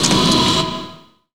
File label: X MACH, MACHINES, Production Effects; Best Service - XXL Production Effects